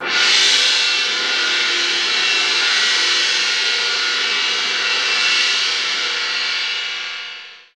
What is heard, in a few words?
CYM, Orchestral Perc; Roland LCDP03